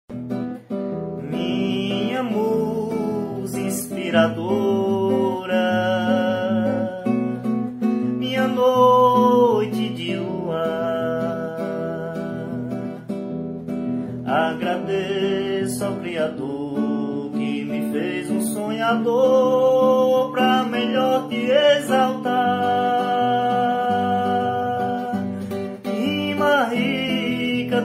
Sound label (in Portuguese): samba